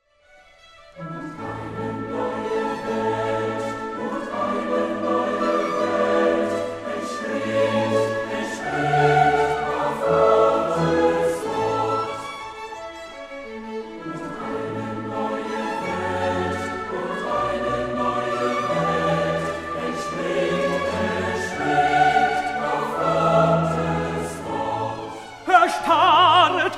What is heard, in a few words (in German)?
chor